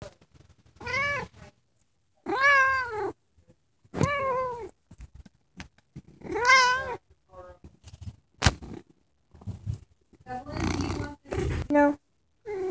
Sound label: meowing